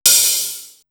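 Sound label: Hi hat, Drum One Shots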